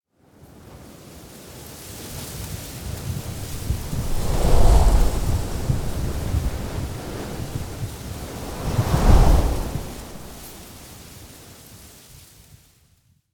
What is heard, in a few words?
Desert strong wind blow sand and dust; nature